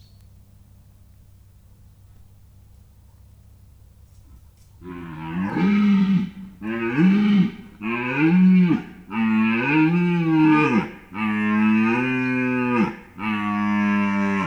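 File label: red deer, longer bird; budakeszi zoo 2010; gimszarvas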